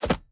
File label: environment object grab magic scroll